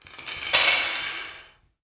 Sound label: Steel Chain Dragged Hard Reverb